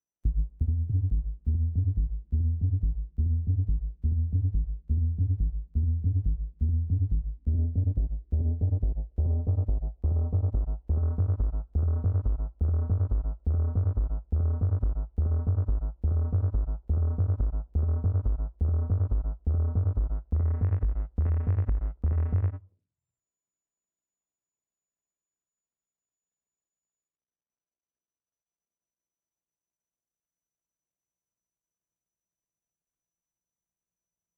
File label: OP bas